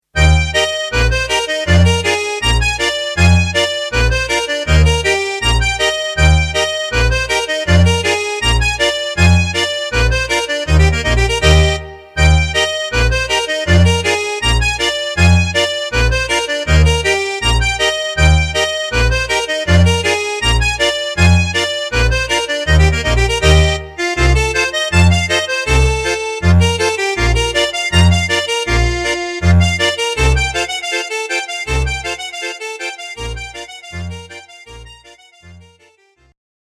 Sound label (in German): Polka